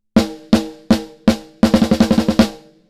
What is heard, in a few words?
snare bypass; Neural Q